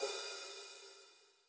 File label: hi hat 14 inch open; soundscrate